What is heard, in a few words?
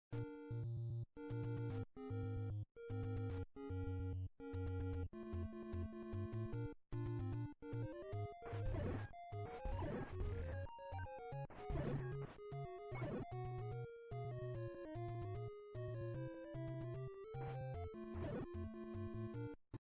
Zelda game NES; Zomboid; Video Game Consoles